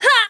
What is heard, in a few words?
damage; MonsterHunter; Fight